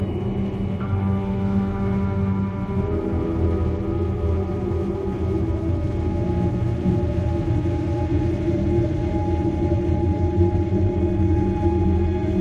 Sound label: music haunting shadows; halloween